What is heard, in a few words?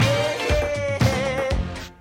yeayeayea